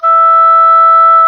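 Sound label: E5 soft vibrato, EBONY OBOE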